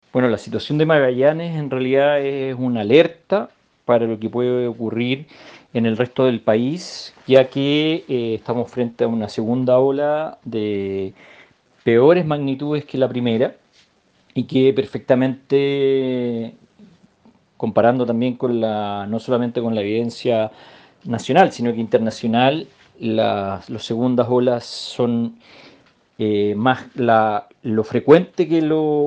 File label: BASS